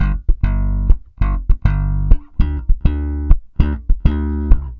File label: BAI BS G, BASS BAION